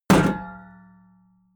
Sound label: shield metal impact ring damped